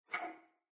Metallic Hit Beep Reverb; Scifi Production Elements, Set 2; Lynne Music

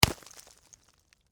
Impact Ice Block, Hammer; Impact, Ice, Block, Hammer, Wet; Miscellaneous Impact

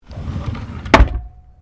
Drawer Laminate Medium Empty Close

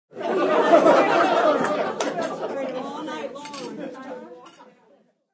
Crwd Lgh, A crowd laughs; Laughter

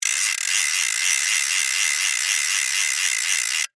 Gear Wind Fast, Large, Long, Plastic, Clicks; Foley, Gear, Wind, Large, Fast, Long, Plastic, Clicks

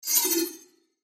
WHOOSH TO HIT, Whoosh To Hit Flange; Blastwave

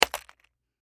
Bullet Shell; Bullet Shell 38 Special Drop Debris; Drops (Sound Effects:Objects); Blastwave; Warfare

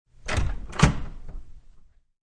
Old Doorknob, Older door is opened; Miscellaneous Doors (Sound Effects:Doors)

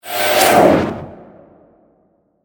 Dimension, Portal, Suction, Science Fiction, 5.1

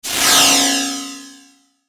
Whoosh Metallic Static Flange; Blastwave

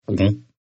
Gulp, Eating; Human

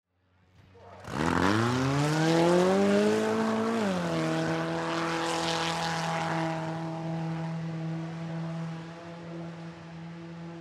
Swamp Jeep Single Race Around Track Fans Cheer; Transportation Watercraft; Boat; Boat Sound Effects; Blastwave

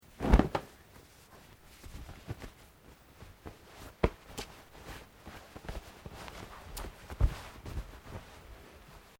Cloth Cotton Shake Movement Sequence; Material components for constructing more complex sounds; Dressing; SoundEffects Foundation2

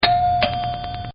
SHUT DOOR BELL; Doors General; Doorbells

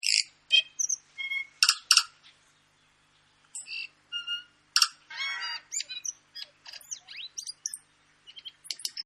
Tui, Birds; Animals

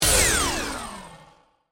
Descend Digital; Descends; Sound Design; BlastWave

